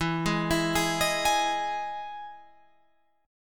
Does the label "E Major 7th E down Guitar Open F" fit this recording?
Yes